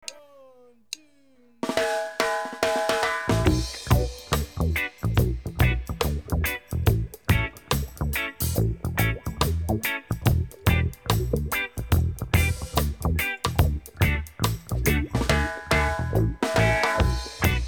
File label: Reggae Fusion